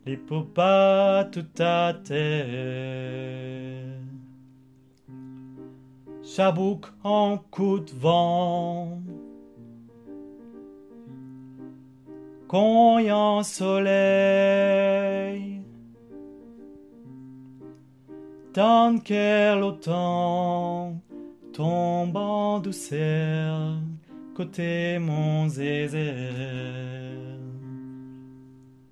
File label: tutti